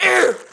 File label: frost alf male hit; DAoC